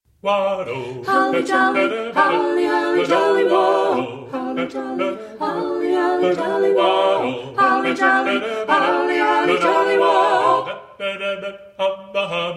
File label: Carolers; Minneapolis